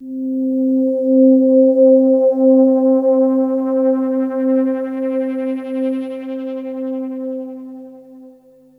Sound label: Synth hits and riffs